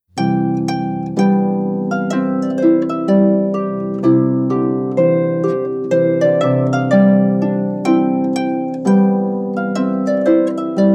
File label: Trumpet Tune; Purcell